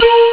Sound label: claves; Amiga Soundtracker Sample Packs